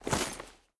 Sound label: pet armor; smite; lancelot